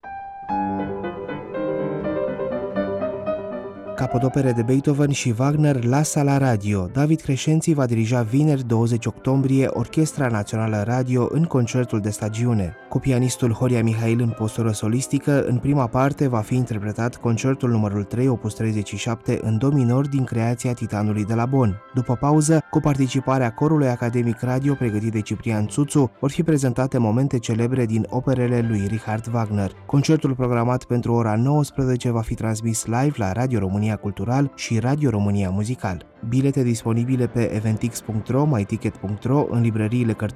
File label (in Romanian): PROMO Concertul nr 3 op 37 in do minor pentru pian si orchestra